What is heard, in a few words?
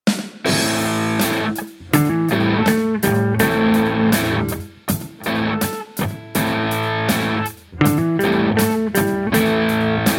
Slow Minor Blues